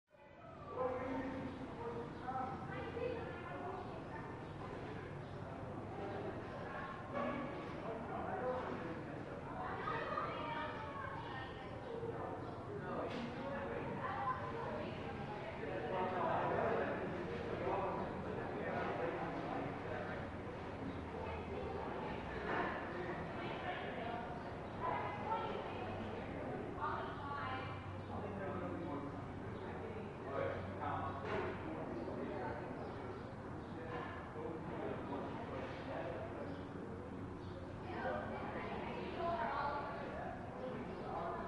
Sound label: Walla Medium Group, hallways